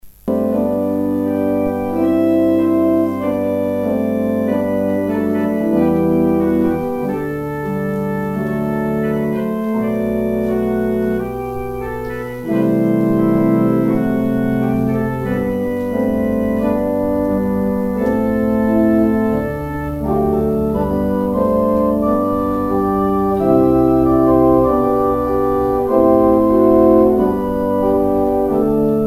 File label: organ interlude; Offering interlude